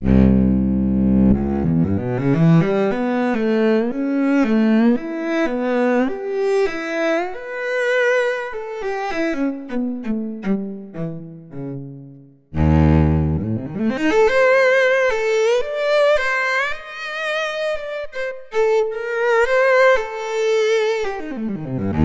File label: Cello